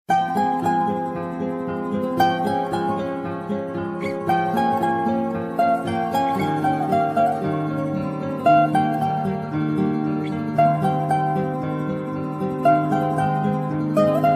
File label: Soft Piano